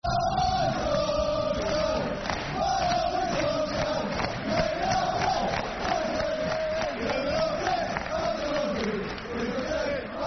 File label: Football Chants, Soccer Songs; Wigan Wafc; fanchants